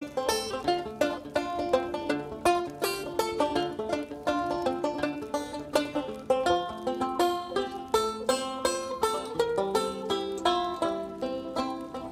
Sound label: Banjo; SoT Grogg